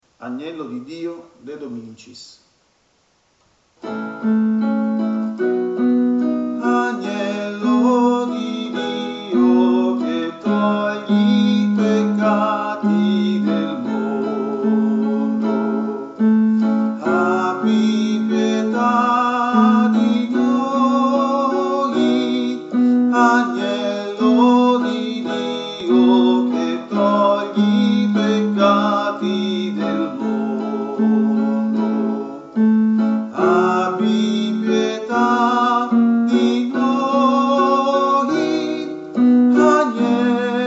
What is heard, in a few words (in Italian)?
Agnello di Dio (ten); canti; registrazioni